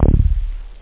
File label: 303 fat house bass; tb303